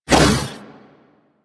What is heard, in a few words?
Ice Weapon Beam Retract; Spec Ops Ice Moa; Warframe; Corpus; Corpus Railjack